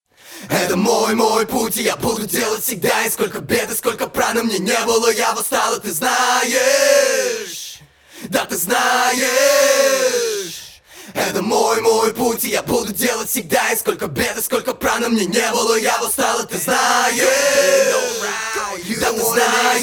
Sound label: acapella